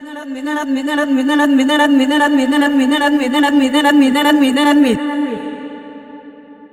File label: Danadanaana, Vocals Female; Sonic Foundry (Sony Creative Software); World Pop